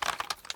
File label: rattle; gmod; arccw uc commons attachments